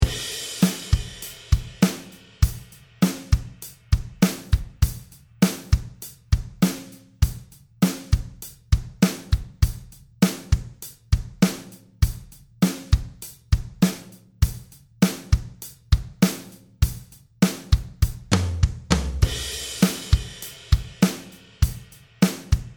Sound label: Drums 100bpm